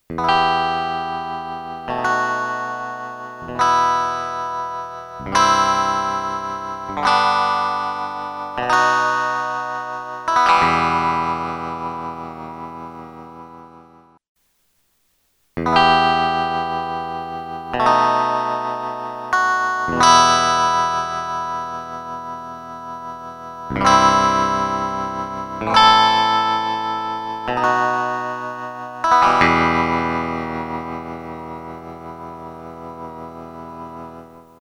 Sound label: Soft Tremolo; t.c. electronics M300